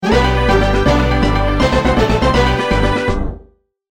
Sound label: Evolution Successful!